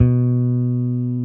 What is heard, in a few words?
BASSES, Roland